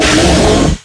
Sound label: pain